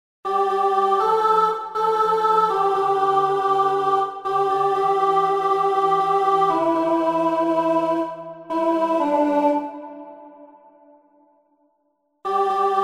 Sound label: Bridge + Chorus Tune Alto